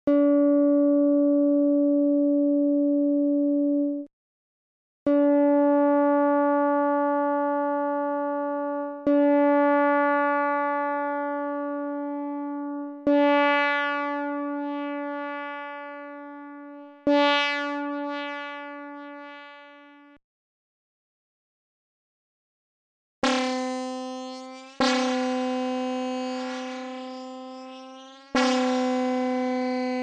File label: Linear vs. Nonlinear String Sounds